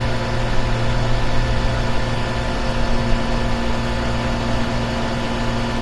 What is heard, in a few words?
Electric Motor